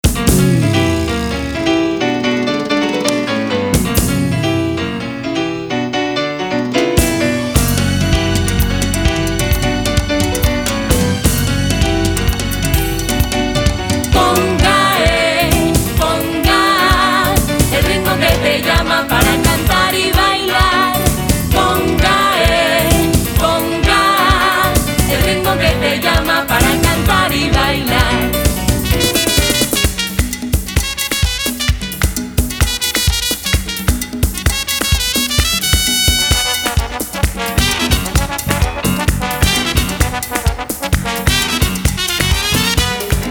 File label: Conga